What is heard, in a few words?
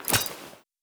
hit, knife; weapons; cw20 knife g4p